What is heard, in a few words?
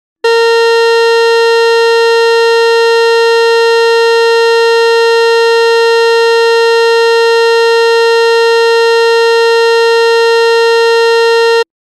Horn